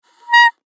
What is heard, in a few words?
Clarinet Reed, Clarinet Reed Squeak; Cartoon; Musical; Cartoons; Blastwave